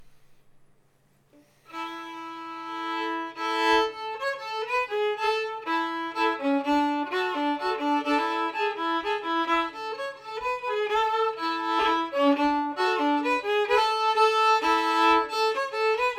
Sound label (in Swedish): gammstöka melodi